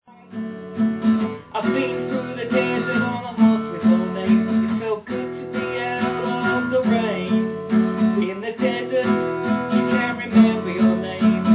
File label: guitar